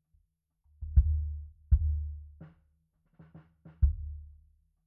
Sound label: Sub